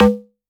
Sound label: Bell Ring; One Shots; Drums; Ableton Live 12 Lite; Core Library